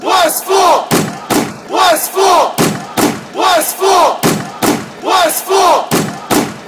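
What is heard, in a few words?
chant, football chants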